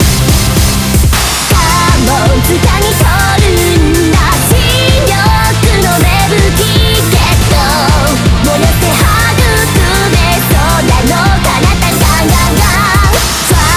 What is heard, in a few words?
BGM Reach